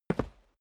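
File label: step boots single concrete single stair up, footsteps; schritte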